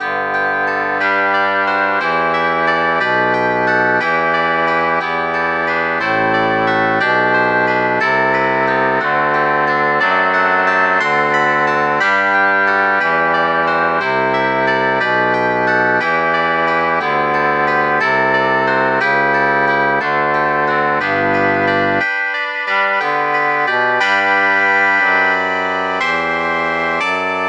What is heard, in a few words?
shenai